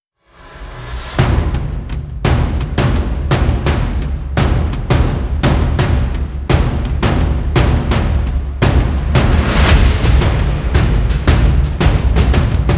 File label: tension drum fx; gerilim; By Burak Sound Bank; tension vol 6